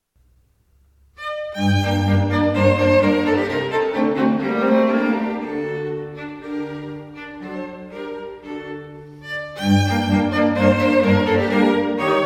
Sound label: String Quartet In G Major